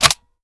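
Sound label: sniper reload mag in; gmod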